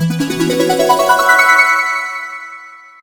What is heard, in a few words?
music unfold, notifications; Samsung GT i7680